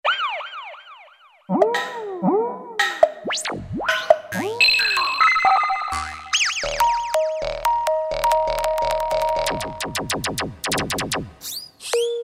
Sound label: F.X.; Latin And F.X. Percussions; Roland SN-U110-02